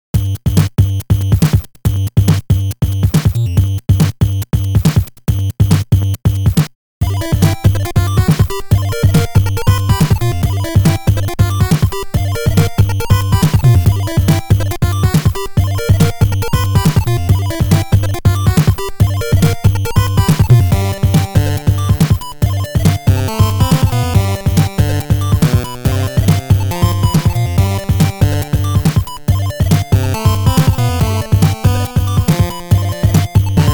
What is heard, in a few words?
funky putter; weeklybeats 2014